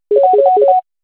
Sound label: Ring Tune